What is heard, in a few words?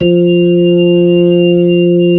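organ, Keyboards of The 60's and 70's; Xfade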